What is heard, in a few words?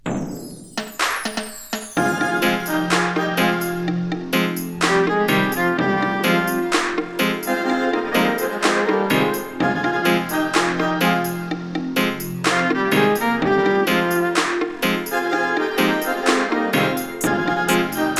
violins call